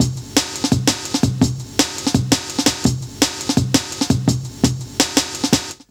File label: LOOP, Jungle; USB Soundscan vol.05 - Explosive Jungle; AKAI